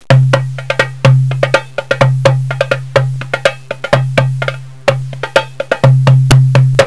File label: instrument darbouka